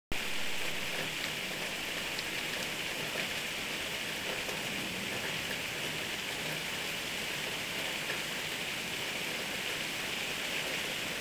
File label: rain; base pack